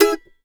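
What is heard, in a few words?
mandolin E major, Country; Sampleheads; Whole Lotta Country 1 & 2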